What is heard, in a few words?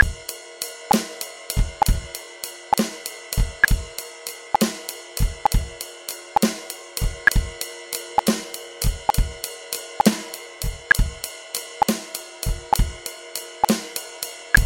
12 8 simple, batteur